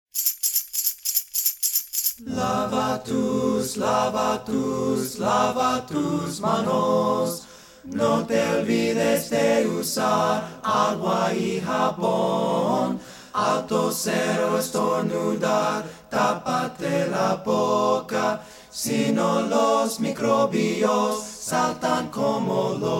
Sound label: jingle spanish